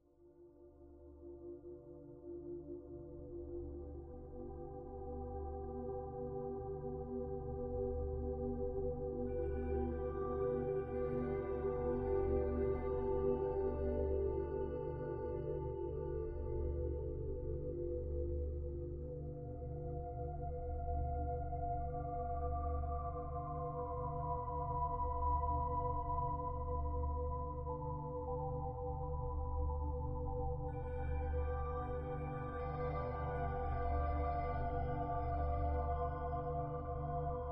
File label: Brain Entrainment Relaxation Loop